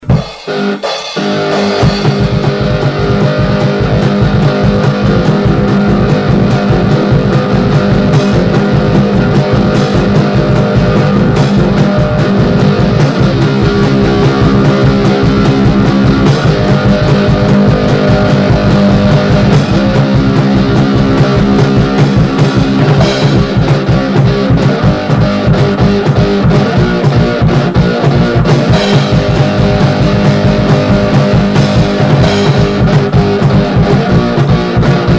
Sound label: Instrumental